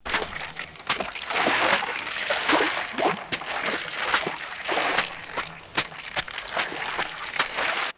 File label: rapid footsteps splashing in shallow water